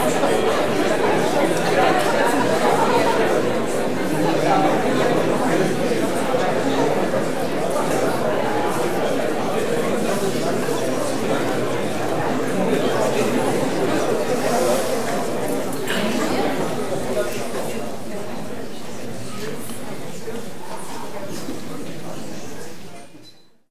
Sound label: crowd getting quiet, interior; best service Blue Box; crowds interior